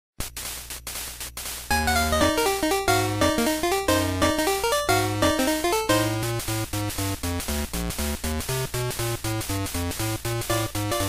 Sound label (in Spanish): Modo captura rojo Pinball